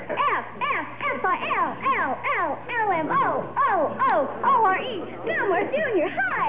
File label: cheer; bradybunch